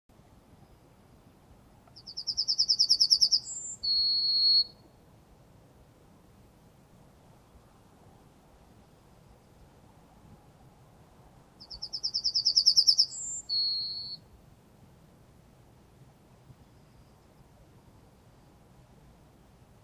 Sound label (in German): Goldammer; Vogel